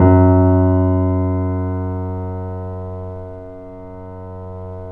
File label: The Piano, F#; InVision Interactive - The Piano; BOESINGSOFT